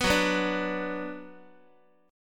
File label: F sharp Suspended 4th Sharp 5th, Guitar; strummed; F#sus4#5